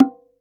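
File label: Bongo low; 300 Drum Machines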